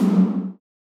Retro Instrument drumset C07; Instruments

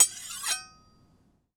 Big Knife Scrape, kitchen knives; Micro Pack

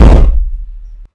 Explosion; weapons

